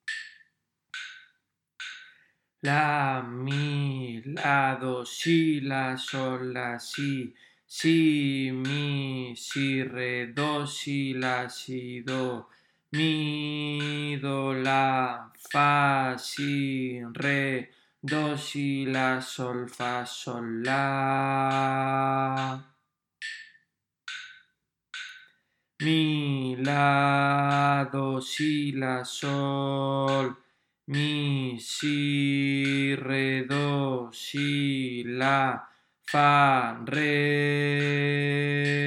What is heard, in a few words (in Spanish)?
RITMO